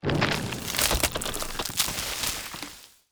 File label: lumber tree expire